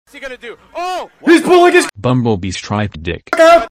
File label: bumble bee